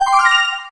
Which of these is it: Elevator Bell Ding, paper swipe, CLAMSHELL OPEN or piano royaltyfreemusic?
CLAMSHELL OPEN